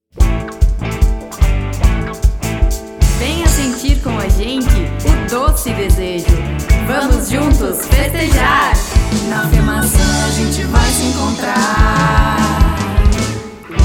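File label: jingle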